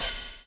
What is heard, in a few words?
BUBBLE; Spectrasonics - Backbeat